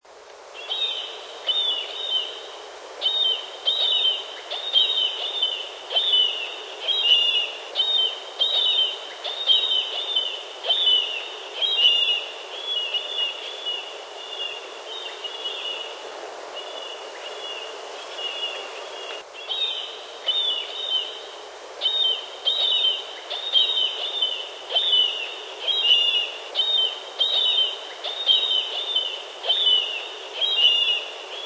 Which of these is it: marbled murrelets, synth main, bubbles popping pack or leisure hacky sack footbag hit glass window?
marbled murrelets